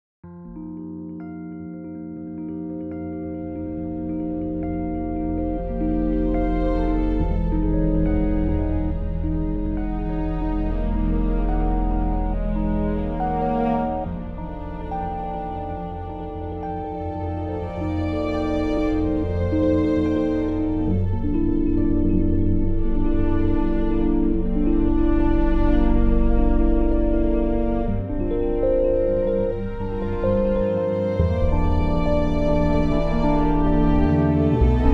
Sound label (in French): Triste pluie